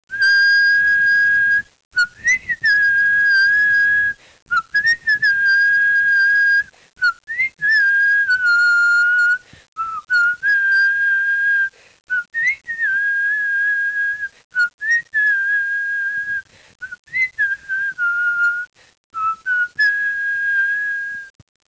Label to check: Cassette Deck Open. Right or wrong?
Wrong